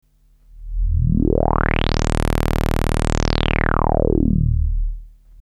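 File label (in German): Filtersweep Sägezahn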